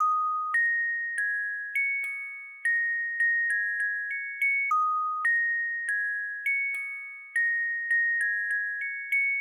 Bells

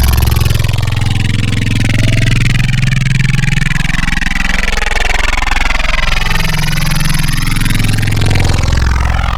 Heavy Neuro, Wavetables; Cymatics; Secrets Wavetables; Serum

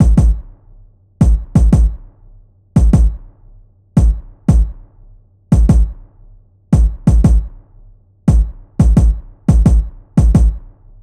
Kick; big fish audio; Hip Hop Exotica; Apple Loops

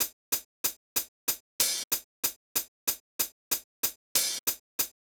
Hats, 094, Cmin, Drum Tracks

